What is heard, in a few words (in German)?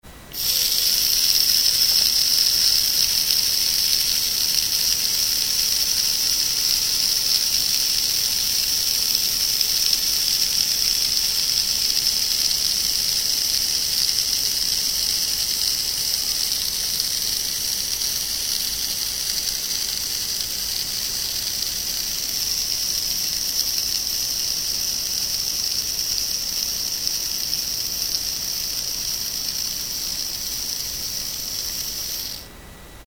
Selbstauslöser